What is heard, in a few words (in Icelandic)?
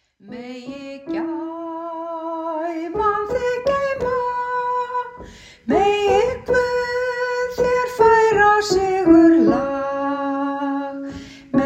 sopran; Megi gæfan þig geyma